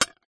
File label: drinking glass down on glass surface; household; zapsplat